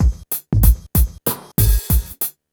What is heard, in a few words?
PHATT BEA, Hip Hop